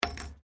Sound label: Coin Penny Single Drop Wood; Common coins; Money Sound Effects